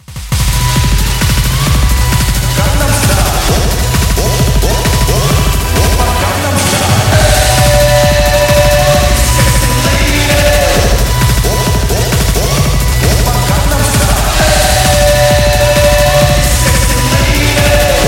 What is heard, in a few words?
Swimming pool